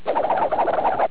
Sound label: spin